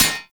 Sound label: Short Sword Medium Impact Armour; Weapon Impacts; Player; Swords; Combat